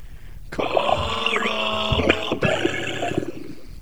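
singing male western log residual normalized, Residual component normalized to -16dB RMS; Western Male Singer; Power Scaling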